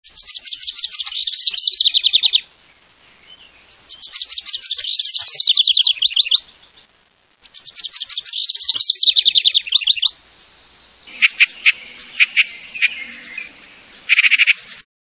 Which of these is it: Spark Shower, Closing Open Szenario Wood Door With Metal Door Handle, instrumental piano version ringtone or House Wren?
House Wren